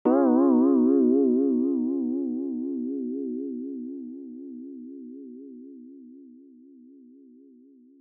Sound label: vibrato